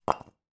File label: foley breeze block drop on hard ground